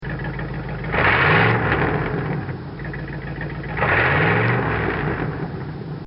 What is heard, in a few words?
Rev Motor; Motors (Sound Effects:Machines); This sound effect was recorded in a custom built sound booth